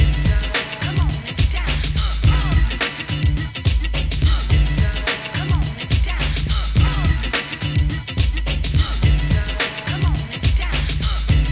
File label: hiphop